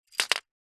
leisure game disc drop into grid tower game play single; connect 4